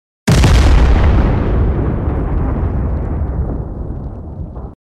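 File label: sound of explosion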